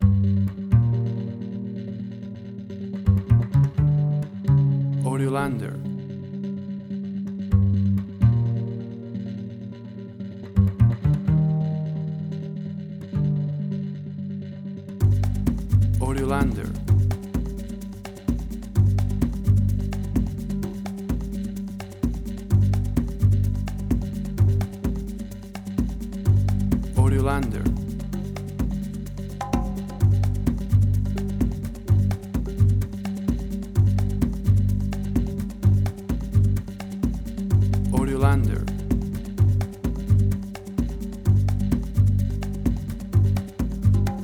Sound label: 128bpm